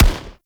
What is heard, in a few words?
Arch Long Rifle Shot; Warframe; Weapons; Archwing